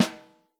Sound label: Vintage Snare Drum, 6.5X14; Q Up Arts; Johnny.C's Vintage Snare Drum; 6.5X14 LUD